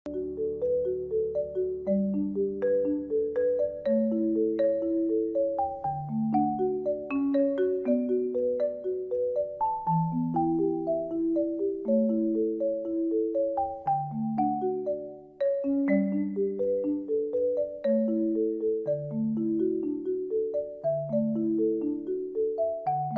Uplifting Happy Peaceful Calm Relaxing Marimba Violin Music (Instrumental Music); Obscure: Challenge Your Mind